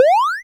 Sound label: jump high